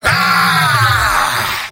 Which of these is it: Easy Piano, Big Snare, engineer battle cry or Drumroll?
engineer battle cry